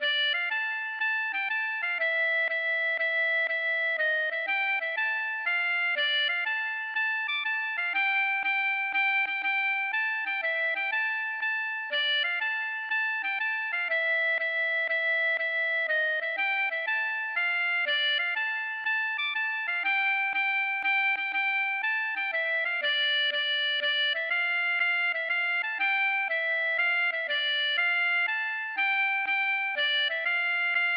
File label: mazurka